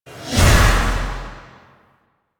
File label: Temporal Drag Cast; Warframe; Focus; Zenurik